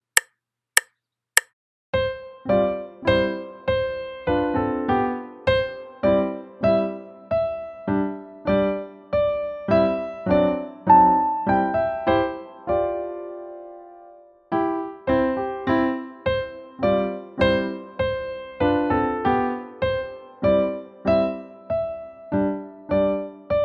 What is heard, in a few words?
Waltz Teacher